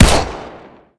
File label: fire, uzi; arccw ud; Decay